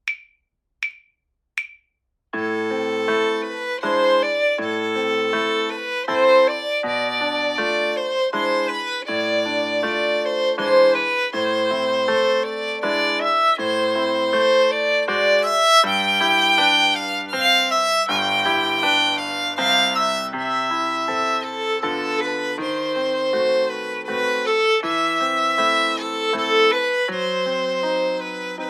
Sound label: Etude with violin; The Violin Fun Book (Level 2)